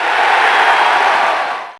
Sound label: Cheer; GTA - Long Night